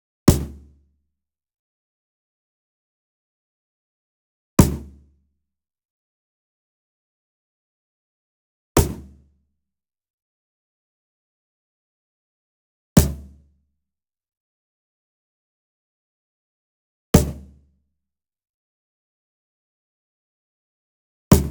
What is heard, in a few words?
Wood Percussion Cajon Hit Coconut Beater Near; boom library; Cinematic Strikes Construction Kit